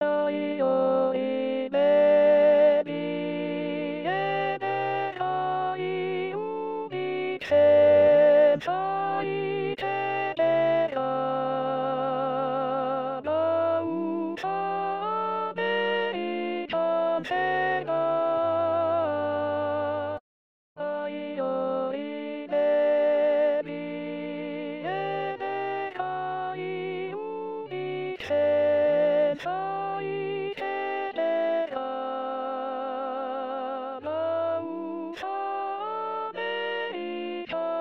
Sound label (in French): chante alt